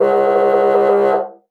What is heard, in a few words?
MULTI, bassoon; Xsample VOL-6